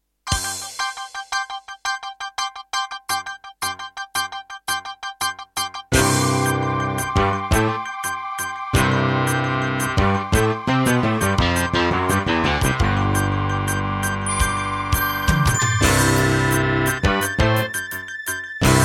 Terratec WaveSystem SCW001-2

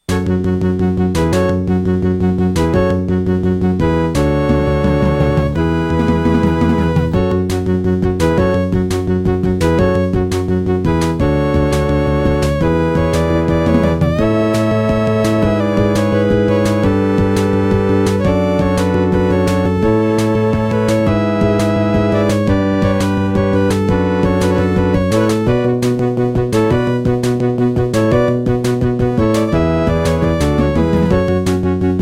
Intro, Creative SB16; 4D Sports Boxing

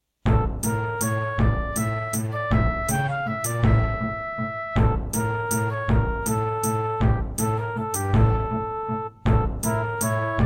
Serdaco Dreamblaster X2GS_NATIVE

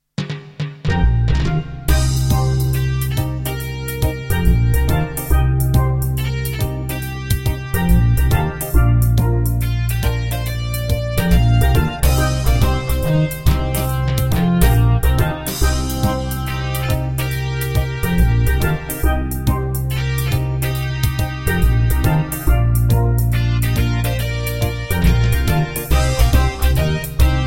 Midiplus MiniEngine USB; Eco Quest

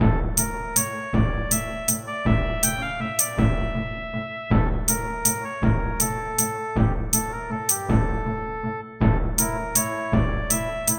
Casio LD-80

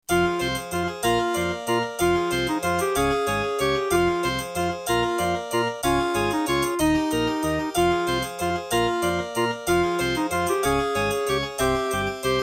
Track 6, Terratec MiniWaveSystem TTWT; Death Gate

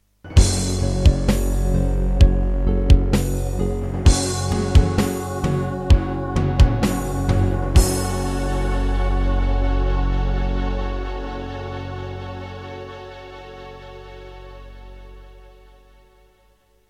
Introduction, Serdaco McQub; Doom (Sigil)

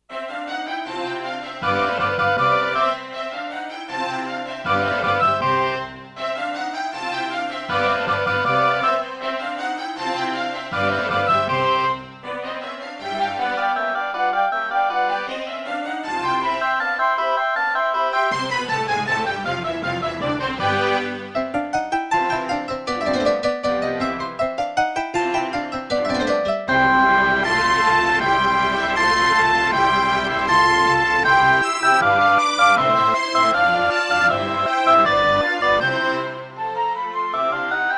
Mozart Concerto K467 partial, Gravis UltraSound

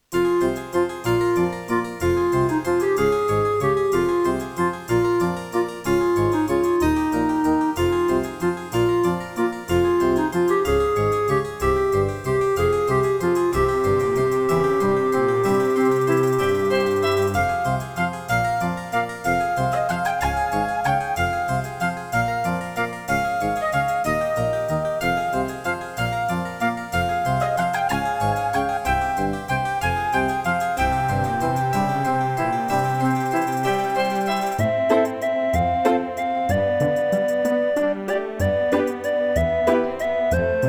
Track 6, Roland CM500 LA MODE; Death Gate

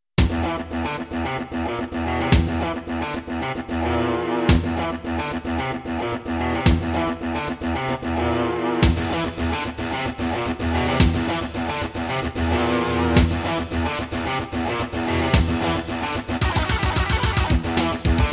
Roland SD-90 GM2MODE; Doom

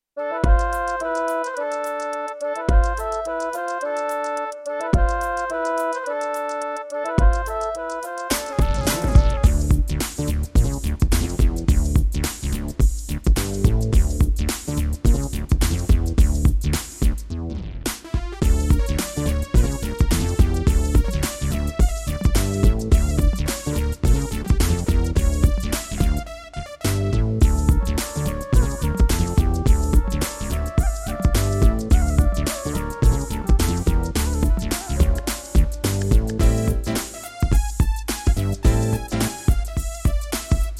Yamaha MU90

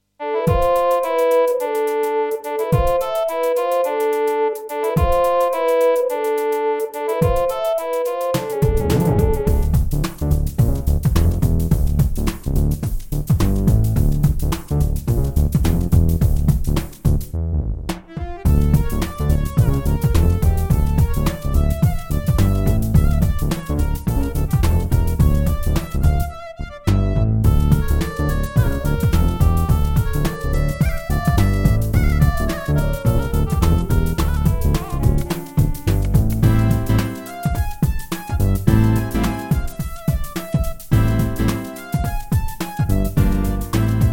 Egypt, Serdaco McFly; Lost Vikings

